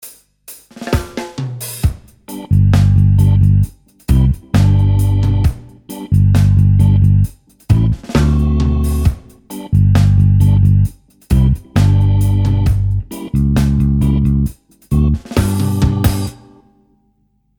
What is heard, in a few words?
Reggae